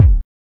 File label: HSE BD